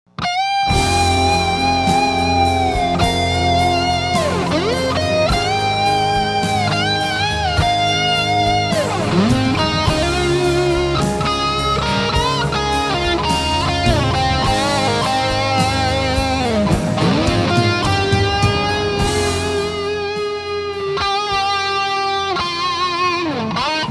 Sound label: solo EMG Strat GR Muff FTTFF FTTAS DR103 Eclips Twin Reverb